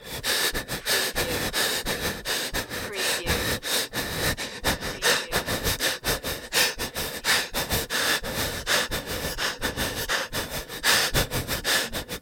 Scared Panicky Breathing - very fast

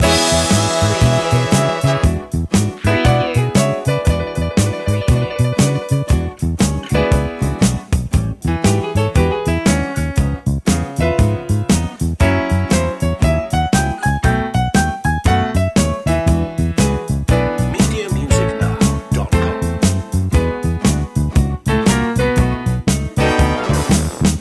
blue loop

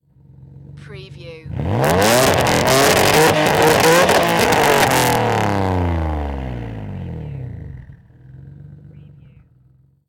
NISSAN 350Z EXHAUST MULTIPLE REV

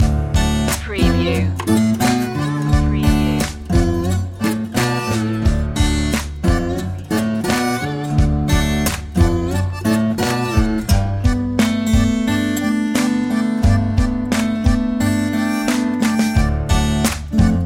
loosy bluesy loop

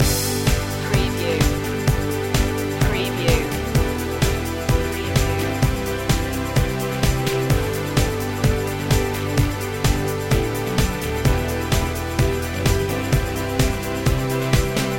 ultraviolet loop

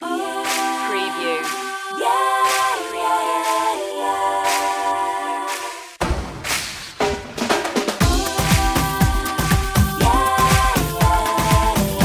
sing yourself happy 120bpm

